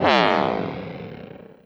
dip blip; Sonic Foundry (Sony Creative Software); Synchro Funk; One Shot Sonics

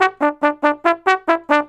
Trombone, Jazz; Sonic Foundry (Sony Creative Software); Jazz Solos and Sections; Traditional

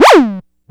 Wow, synth one shot; Sonic Foundry (Sony Creative Software); Electronic Point Blank

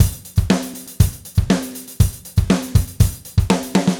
Gretsch groove 90 BPM, 1967 Gretsch Kit drums

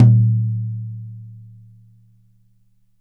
YAM 8X12, drums; Big Fish Audio; Ross Garfield Drums VOL-2

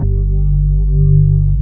B3 HAM, Keyboards Old School

